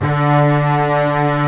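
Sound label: arco basses; E-MU Formula 4000 Series Vol. 5; Protozoa; Proteus2 Presets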